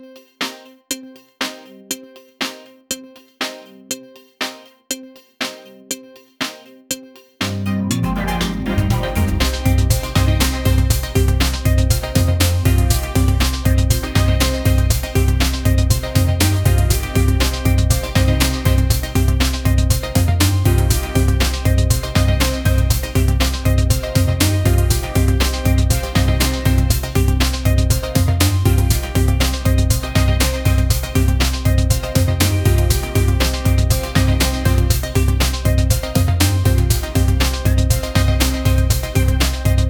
trendy upbeat electronic

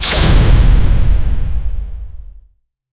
a small explosion followe

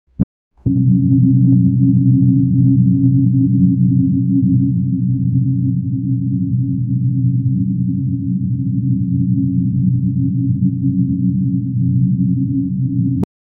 flying drones fpv drones, flight

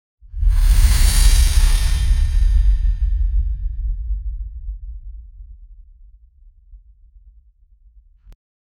corrupted portal open

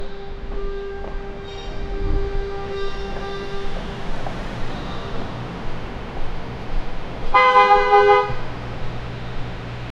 ambient sounds of 1950s, car horns

